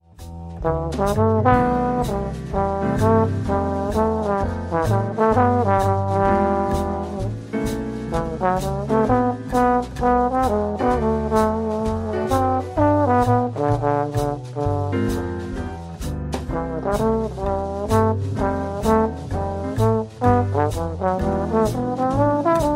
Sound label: trombone